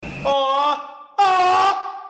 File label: ohhhhhhh ooooohhhhhhhhhh